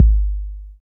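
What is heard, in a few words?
BASS DRUM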